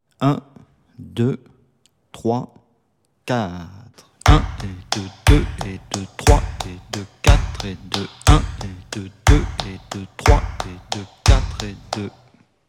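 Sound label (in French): methode pour travailler un rythme 060bpm, guitare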